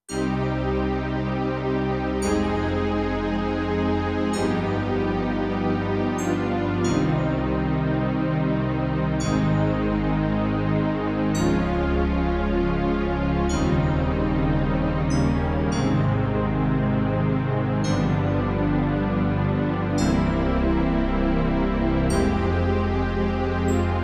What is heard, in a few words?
New Age Pad